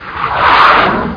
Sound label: Missile; BZFlag; Action